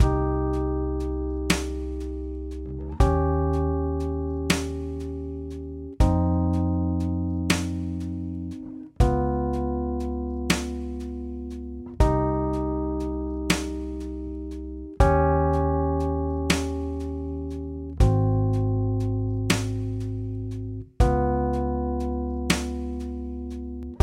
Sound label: solo loop